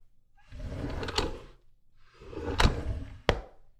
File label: Drawer Wooden